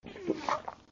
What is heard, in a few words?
slosh; Lewd SFX